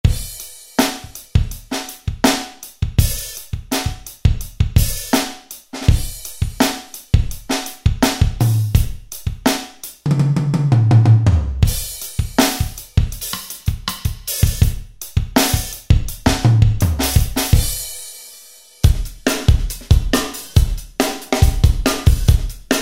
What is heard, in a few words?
Funk; CMB-Funk